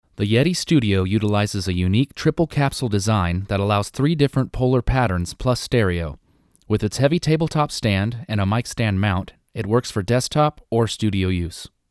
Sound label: Voc Blue Yeti Fig8; usb mic